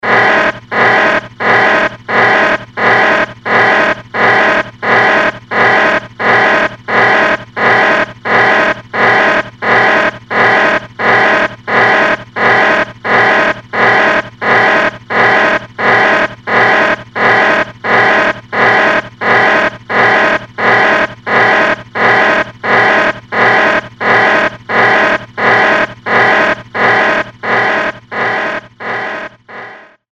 Buzz Alarm

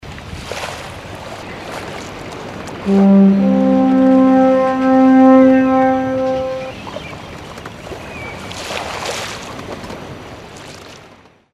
Ship Horn

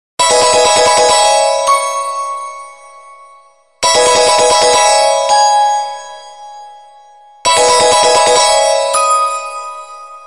Musical Bell, ringtones

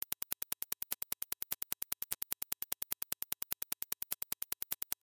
Square Buzz, ringtones

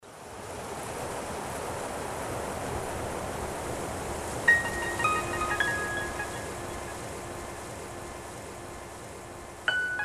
ring ring, ringtones

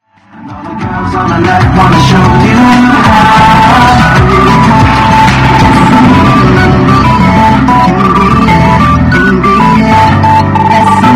The bell, ringtones

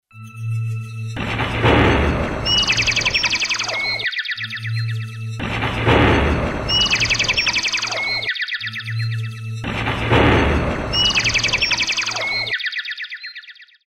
Bird love rain

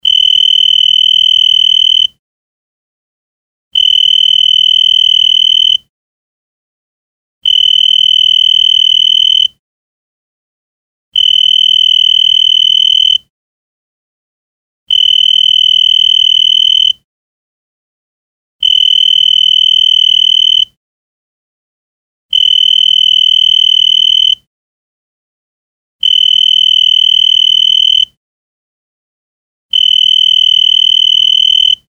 Electric Phone Old Style

